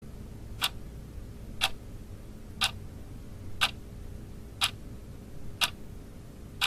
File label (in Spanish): reloj minutero efecto de sonido